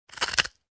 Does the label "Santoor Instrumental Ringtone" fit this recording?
No